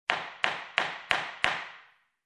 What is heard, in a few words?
Judge gavel hit five times